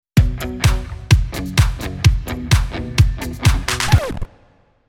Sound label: Party Beat; Dionysus